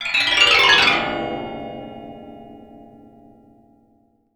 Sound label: waterphone